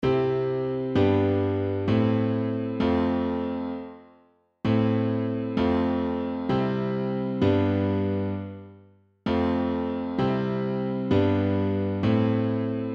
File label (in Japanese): 洋楽王道進行